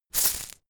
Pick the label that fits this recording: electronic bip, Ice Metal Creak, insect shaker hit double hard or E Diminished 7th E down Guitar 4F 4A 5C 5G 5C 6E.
insect shaker hit double hard